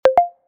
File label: moderator sound